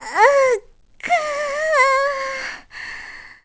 Worried; Tetra; Zelda

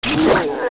Freezzard Hit

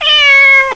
Cat; Cats; Zelda

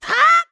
Link Jump; The Wind Waker; Link jumping

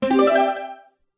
Pause Menu Save Complete; The Wind Waker

